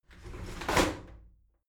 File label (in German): shower slide doors open regular, tueren